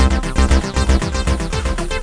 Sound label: Blue Drum; Cloudz 1.0.3